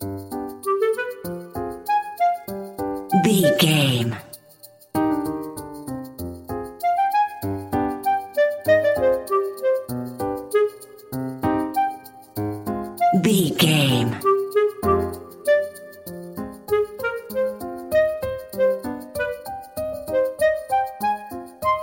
Comical Moments, silly, circus, goofy, comical, innocent, naive, child like, childish; Sitcom; Dramedy